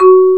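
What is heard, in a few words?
xylophone F#2; AKAI S6000 CD-ROM - Volume 1; Piano; MALLETS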